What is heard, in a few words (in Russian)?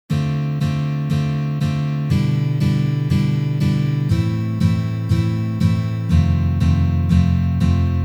Uprazhnenie dlya igry akkorda Dm7